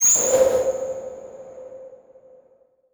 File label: Title Transition Evolver Short; Adobe; Production Element